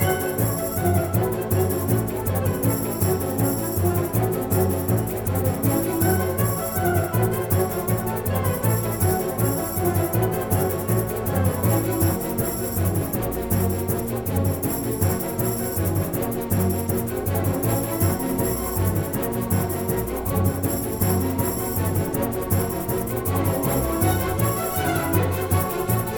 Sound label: Music Fight Brigands Theme Average Bpm160 Loop; asterix obelix slap them all 2